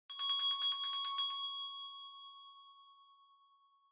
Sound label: Winning Bell Strong